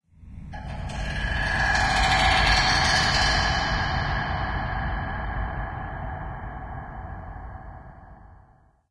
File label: amb click stick, ambient organic; video games; source engine; portal2